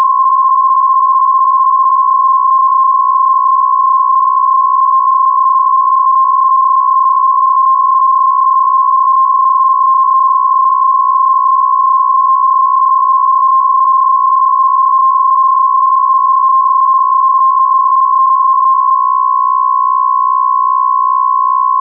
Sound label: BPSK63F, modes